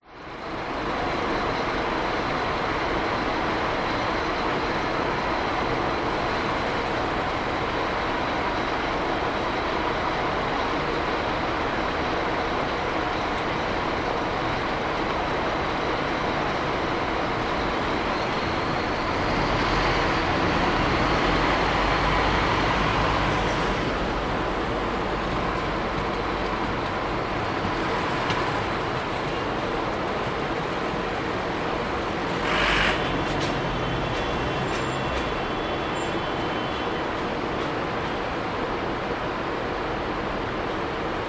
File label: public places city hum occ pedestrian light traffic drone from vent market st, Sydney; cbd